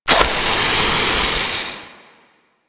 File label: Proto Infested Maggot Spark Start